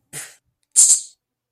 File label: pfft chh